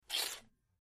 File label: Bite Carrot Juicy